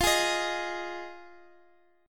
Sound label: F Minor 7th, Guitar Drop D; down; chord shape